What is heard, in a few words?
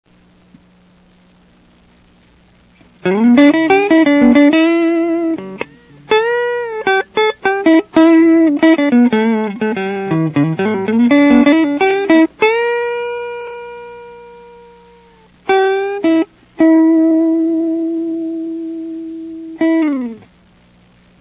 clean champ